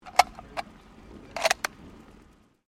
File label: RIFLE 303 MK1 MAGAZINE LOAD